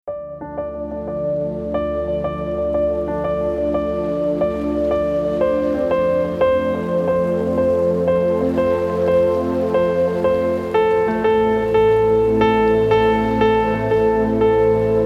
The Ocean Piano Breath Of The Sea Piano Dream Mix

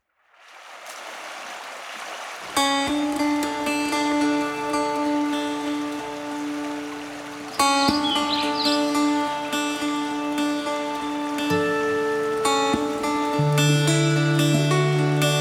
Acoustic

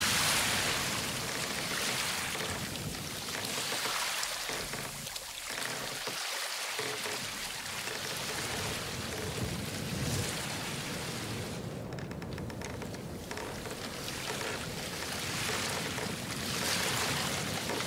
Sounds of Nature Ocean Tides Sound; Sounds of Nature Kingdom

